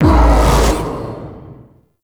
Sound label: Spear Gun Throw Proj Buff Hit; Hoplite